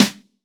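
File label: JAZZ snare, GM drum set; AKAI S-Series CD-ROM Sound Library VOL-8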